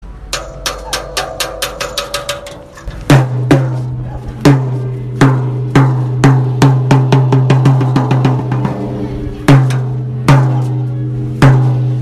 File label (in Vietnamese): Tieng trong tan truong ra ve